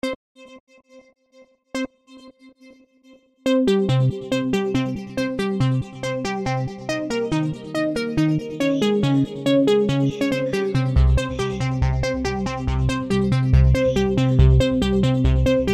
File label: Phone Alarm Speedy Electro Thingy